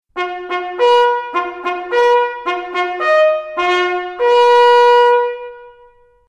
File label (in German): Treiber in den Kessel